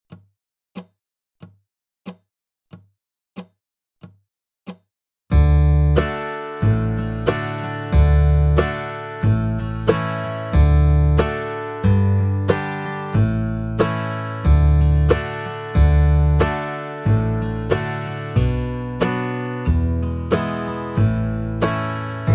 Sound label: backing track 46 BPM; Hark! The Herald Angels Sing; Holiday 2025 Dobro Zoom Workshops